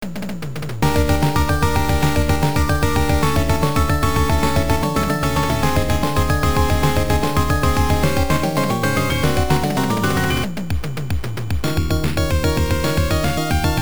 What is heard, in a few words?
Menacing Beat; super star soldier j; turbografx 16; Stage 7 BGM